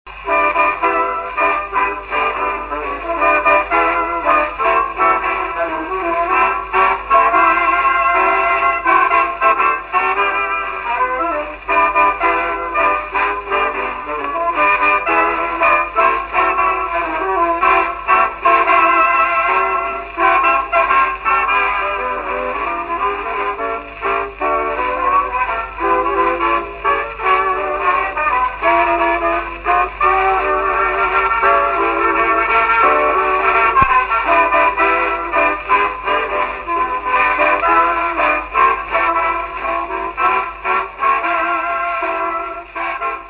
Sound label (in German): Polyphon Tischgrammophon